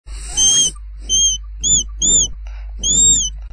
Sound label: mouse